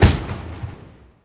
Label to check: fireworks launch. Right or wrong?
Right